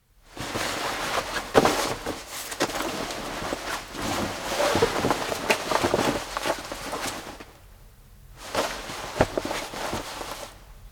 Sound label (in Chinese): 衣物牛仔夹克